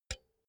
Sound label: pull out cinch quick; objekte; elektronik